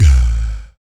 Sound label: VOC PERC, Vocal Planet Jazz FX BASS PERC